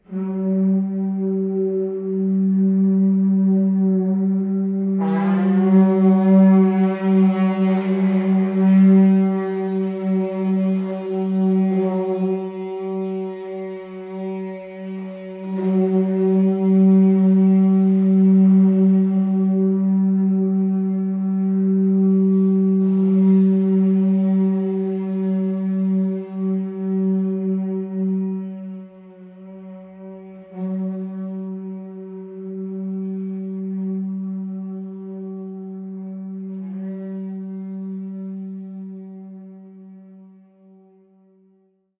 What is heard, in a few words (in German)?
muschelhorn